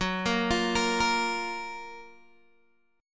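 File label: G flat Major 7th G flat Guitar DADGAD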